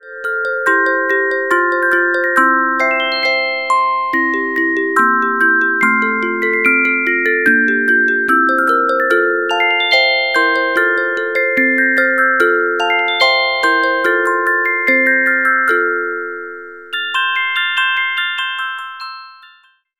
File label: Carillon Music Box Melodies